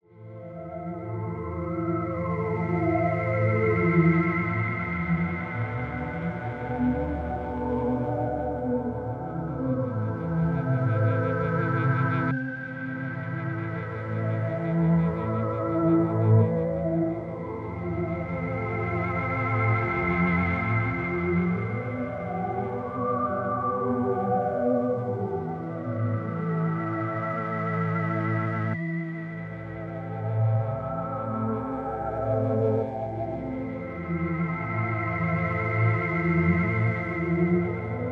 Looking Back Groove

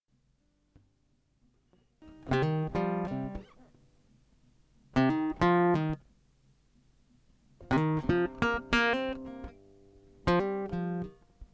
Cm90 Big Bloo Groove

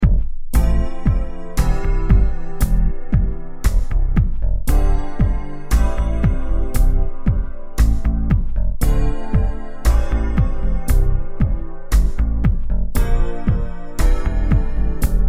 C116 Little Groove

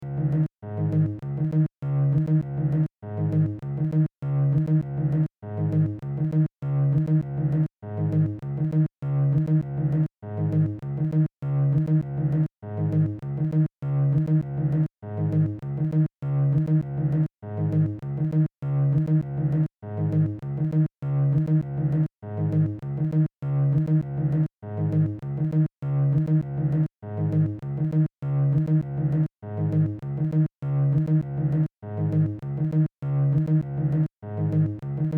C#m100 acoustic

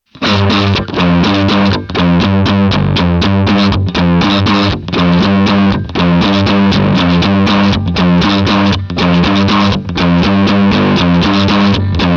Guitar Drop C

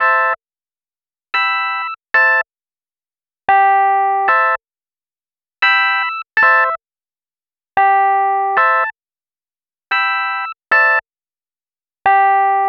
Inequality Groove

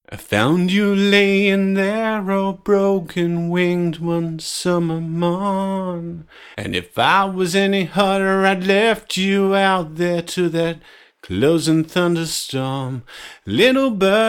Little Bird, pella and birdsounds

